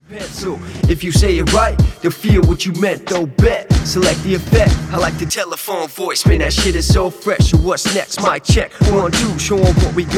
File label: Hiphop